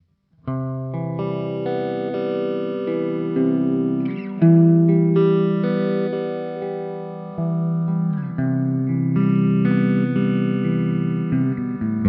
Twin P90; ReTube Custom Shop

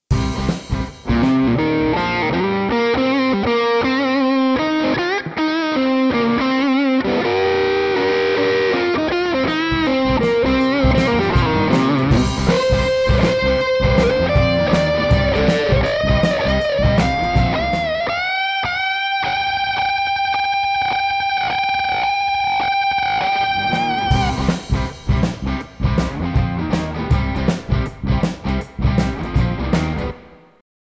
Lead Proco Rat

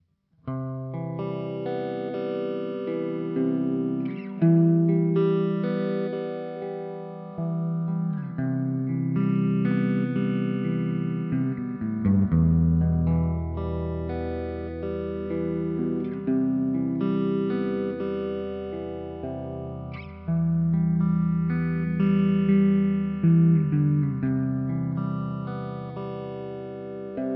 TwinR Phaser Reverb, guitar; TwinR – P90 Phaser; ReTube Custom Shop

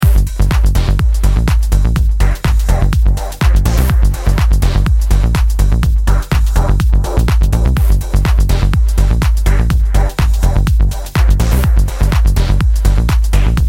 Oldschool Techno WET

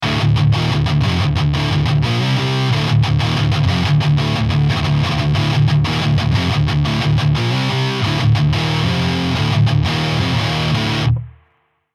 Metal Guitar WET

